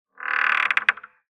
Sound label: creaking door short; everyday objects